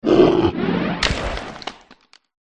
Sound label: dead pirate boss death